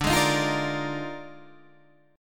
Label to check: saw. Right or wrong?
Wrong